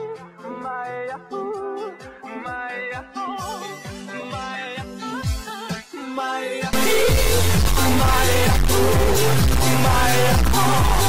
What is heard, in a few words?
bass